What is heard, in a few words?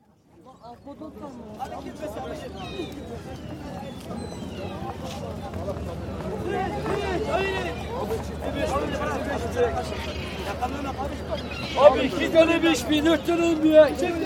Istanbul, quayside at Kadikoy, with fish sellers, gypsies, shoe shine boys, and seagulls; City; BBC